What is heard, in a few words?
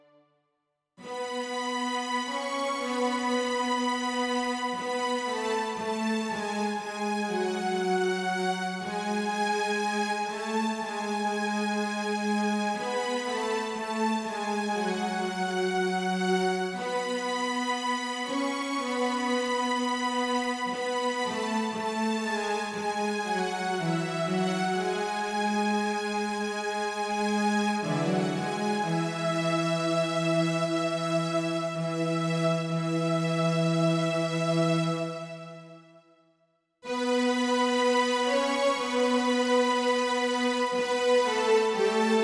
strings